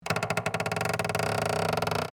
Creak Plastic, Texture, Creak, Plastic

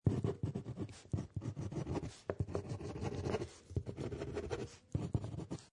Writing Ballpoint Pen, write on paper; Writing, write on paper or drawing on paper with a ballpoint pen.

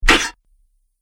Sword Grazes Plate Mail Armor; Sword Sound Effects

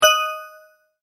multimedia tone correct; Sound Design; McKinney Sound

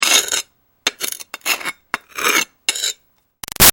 Foley Rock Scraping Trowel Archeaology; Materials; Big Room Sound

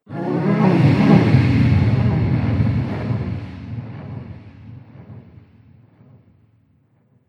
Alien Sex Sound, alien sound effects

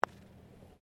Golf Putt; Various Golf sounds; Sports

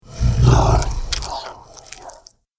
Dinosaur Feeding; Dinosaur Sound Effects; Monster Sound Effect

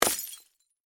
Warfare, Bullet, Impact, Glass; Combat; Blast Wave

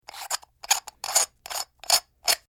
Canister Foley Squeaky Open Close; Squeaks (Sound Effects:Objects)

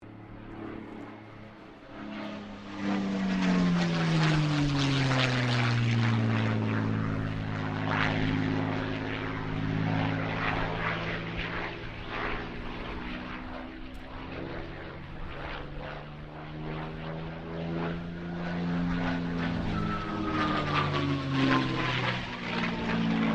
AIRCRAFT CAC CA-18 MUSTANG MK23 LOOP WINDY STEREO; CA-18 /P-51 Mustang; Airplanes

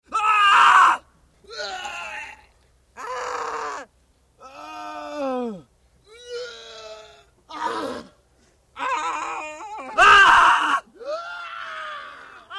Cries and Yells, Various death shouts and screams; good for being stabbed; Yelling

